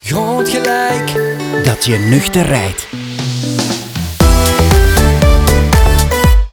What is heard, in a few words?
damn right jingle